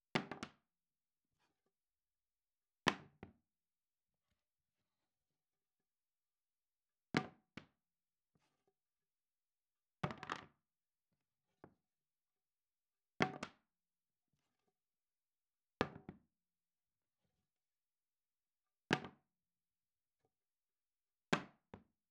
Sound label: Beech Plank 3x27x100cm End Dropped 30cm Onto MDF Close; Sure SM57; Gibson and Ansell; Got Wood